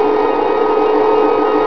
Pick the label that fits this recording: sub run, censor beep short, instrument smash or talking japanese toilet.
sub run